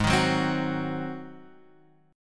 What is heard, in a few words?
G sharp 7th G sharp, Guitar Half Step Down